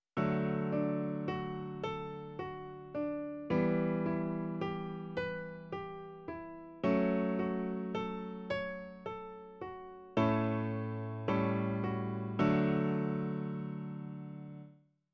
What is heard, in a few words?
thirds, no voice